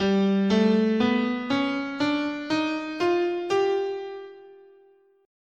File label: G Lydian Minor, scale